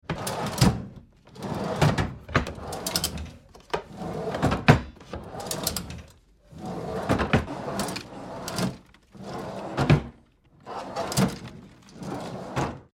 Filing Cabinet Sliding Draws, Wood and metal filing cabine; Wood and metal filing cabinet open close various; Tom Hutchings